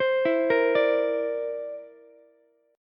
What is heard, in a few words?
D 7th Suspended 2nd Sharp 5th, Ukulele; D7sus2#5 arpeggiated